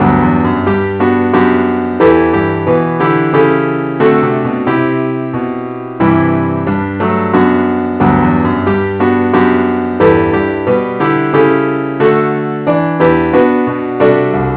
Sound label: keyboard only